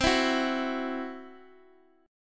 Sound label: C Major Flat 5th, C, down, Guitar, DADGAD